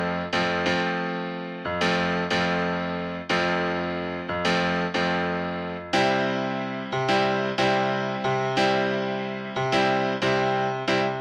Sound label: Piano and Organ